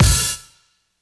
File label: drumbreak kick dry; Hiphop